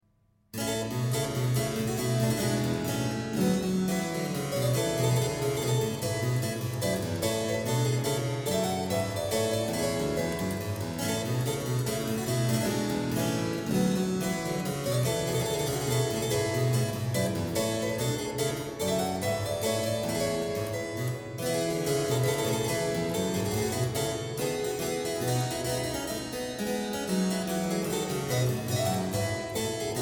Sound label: menuet b dur bwv anh 118; clavier buechlein fuer anna magdalena bach 1725